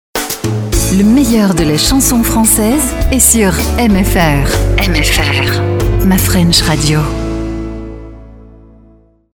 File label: jingle